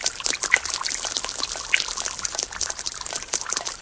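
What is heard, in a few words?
ambient water leak loop; video games; nature; source engine; portal2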